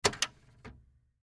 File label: Cassette Deck Open; Technology; Tape Players; Electronics; Blastwave